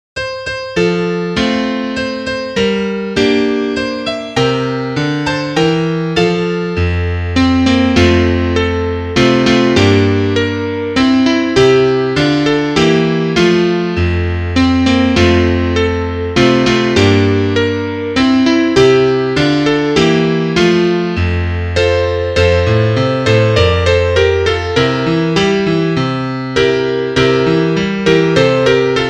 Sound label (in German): Klavier; Liederbuch; hab mein wage vollgelade